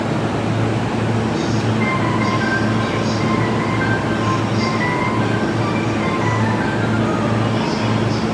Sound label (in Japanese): 埼京線 大宮 北, train sounds